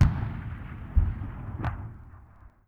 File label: distant gun; WAR